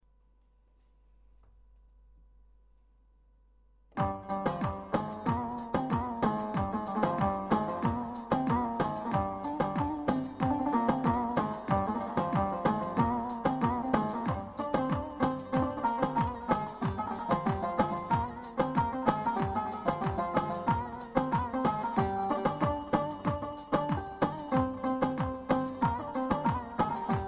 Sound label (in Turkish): pa 55 tr kuru baglama; ses ve ritim demolari